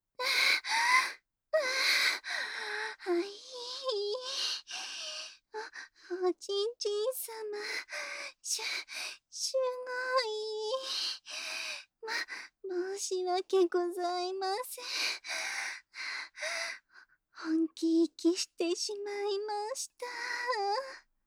はぁっ♥はぁっ♥あひぃ…♥

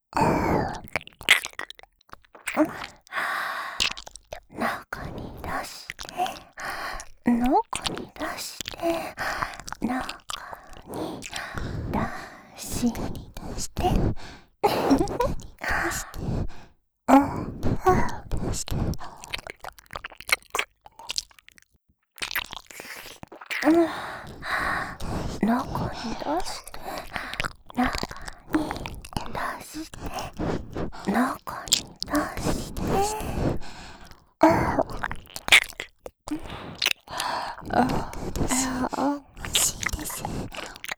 耳舐め; 中に出して♪; ロッテ

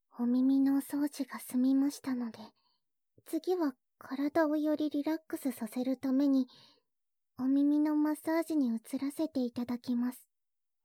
ローションと炭酸でマッサージします, ASMR

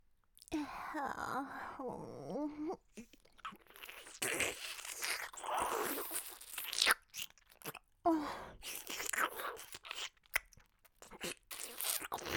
フェラチオゲップ編 ちゅぱ音のみ; ドスケベ清楚なJKのフェラチオ肉便器ゲップコース！

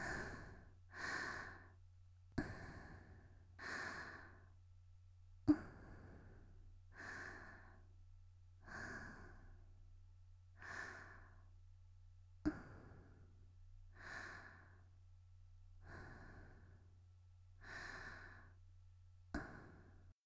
「息」静かな呼吸20秒; 綾波系少女

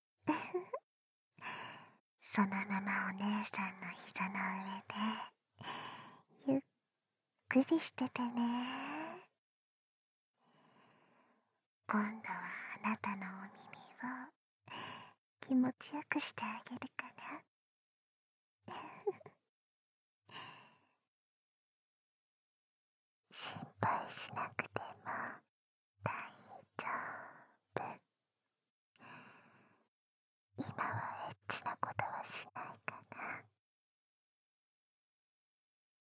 海綿で耳かき（バイノーラルマイク 効果音なし）, ASMR

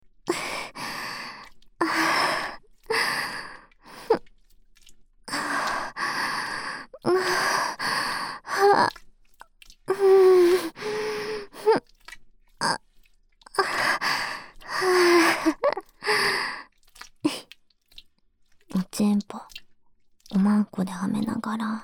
童貞卒業セックス 喘ぎ 耳舐め; ギャルJKと援交えっち！?

